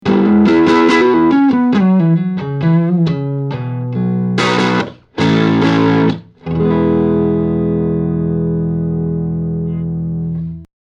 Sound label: fender ramparte